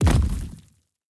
footsteps; smite; atlas

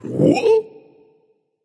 surprised, voice; Smite; ymir skin14

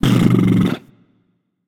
nv tongue; smite; voicepack; hachiman

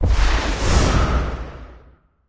Indicator; smite; horus

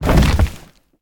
death bodyfall; smite; medusa

